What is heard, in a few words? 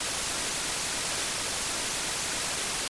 Slide Light Loop; Learn to Fly 2